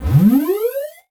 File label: Multimedia Internet CD-Rom Flash Wipe Electronic